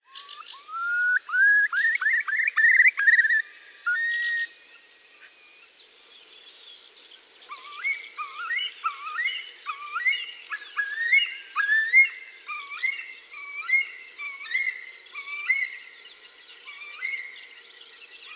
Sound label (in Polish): kulik wielki, birdsounds